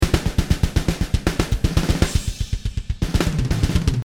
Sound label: Blast